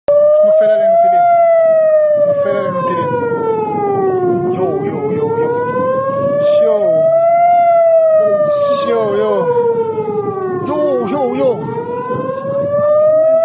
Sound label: sirens, آژیر خطر جنگ; Iran